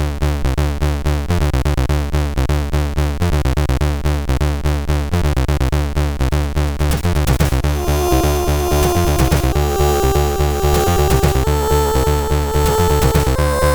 Technology 2, CSG8580R5

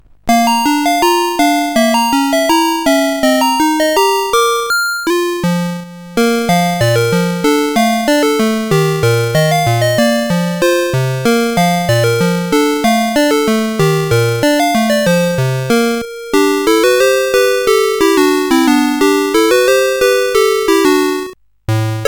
Musicbox, sid CSG8580R5

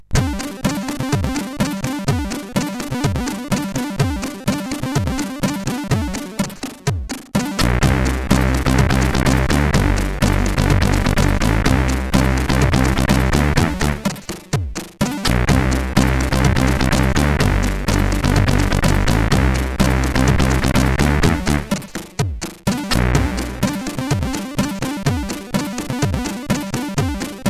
Sound Test, sid CSG8580R5

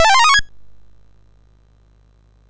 sid MOS6581R4; Hard Hat Mack

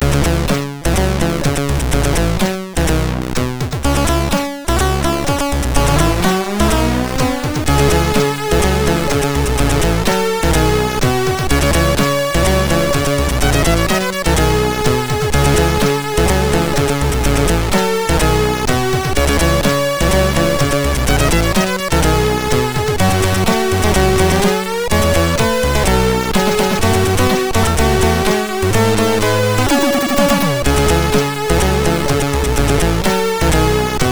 Short Tune, MOS6581R3

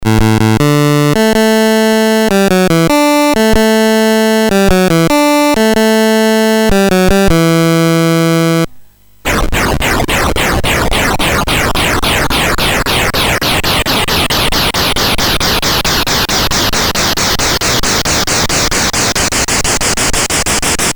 BASIC sid MOS6581R3, GAMES; System 65595

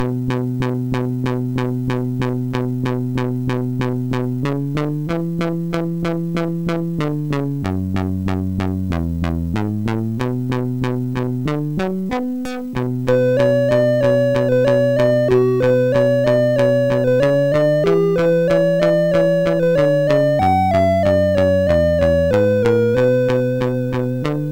Jingle from advert, MOS6581R4; Lenor; hvsc

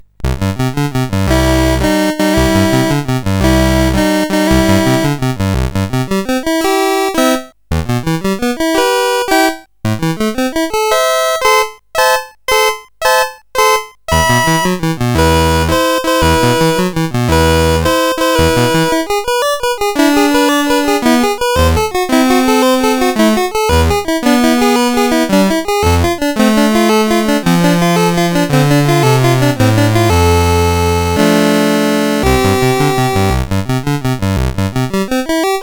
A Passing Storm sid CSG8580R5

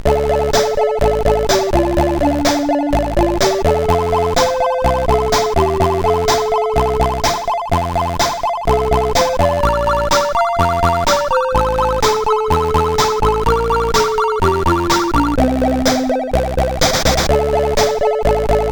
Funny Cover, sid CSG8580R5

